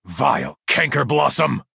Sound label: spellsword attack; wind keep